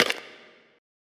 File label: UI Open; FREE SFX PACK VOL 3